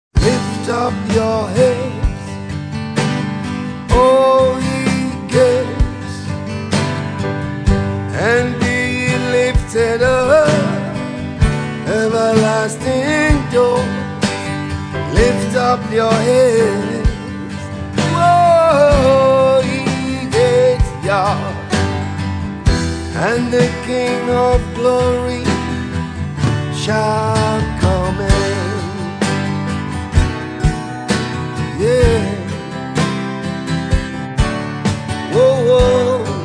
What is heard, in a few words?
Acoustic Track